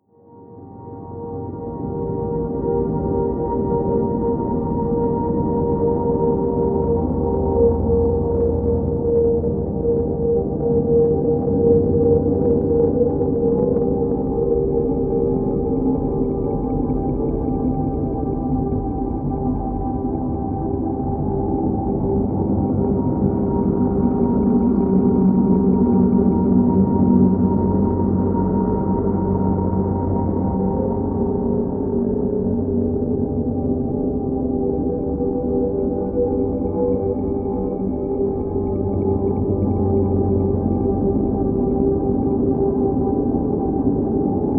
drone singing

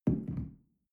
shell place on tablecloth soft; berufe; gastronomie; soundpool

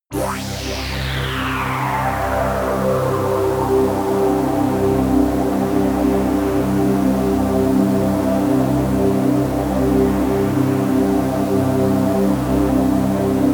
pad sweep hiss; flaechen

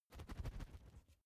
feather flapping short; kreaturen

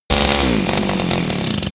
chain saw stop; maschinen; saege; soundpool

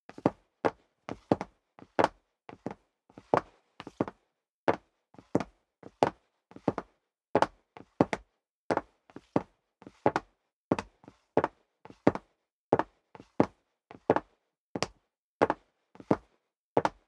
step sneaker wood parquet walk sequence; schritte

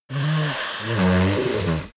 yeti nose blow long

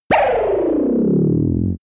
laser gun recoil; krieg-waffen; soundpool

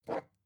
glass pick up smooth fast; objekte

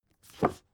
book shelve hard

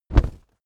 step monster step heavy

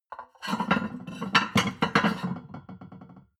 plate on plate place multiple, restaurant cafe

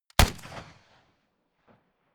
rifle ak-47 exterior fire single clean; comedy cartoon; pistolen gewehre; Pistols and guns

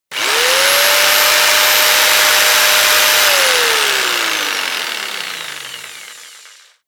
jigsaw engine fast long; maschinen; saege; soundpool

